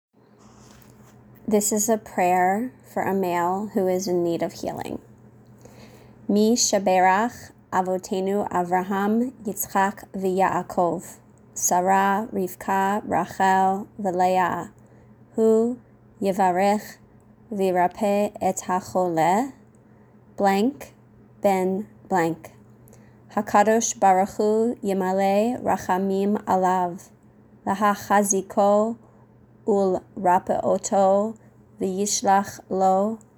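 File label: Misheberach for Males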